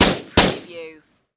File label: Machine Gun Sig SG5